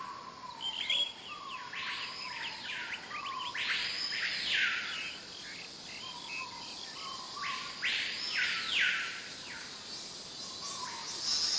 ambient green jungleish

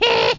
piano fis

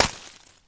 ice light collision

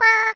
piano f

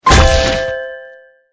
birthday cake

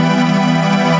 c minor

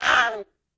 bird collision low

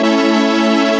f major

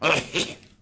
piglette oink

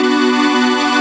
g minor left

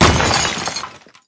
stalaktite break

